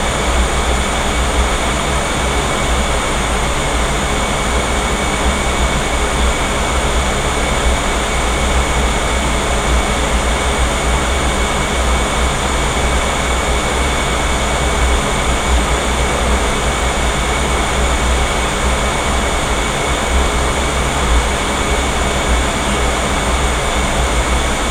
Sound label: UT1 chiller, Paranal; soundscape